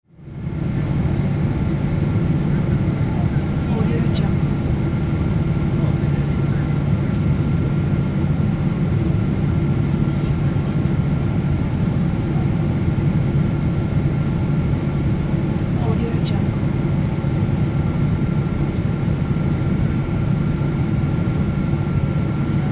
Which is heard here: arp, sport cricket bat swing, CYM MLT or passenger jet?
passenger jet